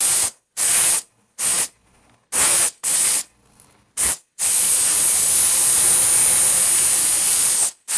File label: aerosol hairspray short and long bursts